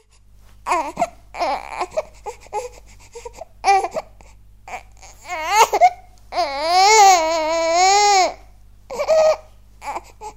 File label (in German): schrei